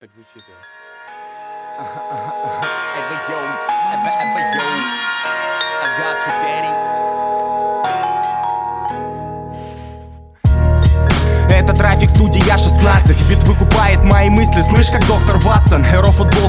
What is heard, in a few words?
lofi